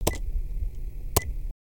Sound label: Racer Computer Idle; gnarled forest